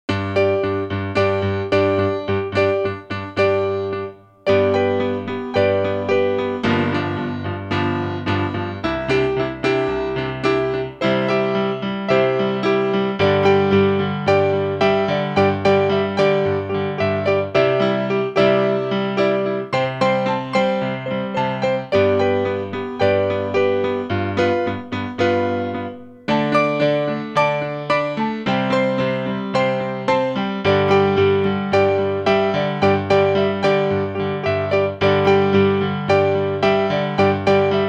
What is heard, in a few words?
Slide Guitar 110 BPM In G